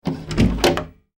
household door glass panel internal open, Wooden Glass Panel Door Open; Door Open - Wooden Glass Panel Door Opening; Doors; Sliding Doors; McKinneySound